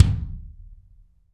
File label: BD AMBIENT, Drums; Real Mega Drums